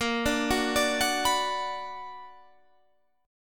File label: B Minor Bb, Bm/Bb chord, guitar; arpeggiated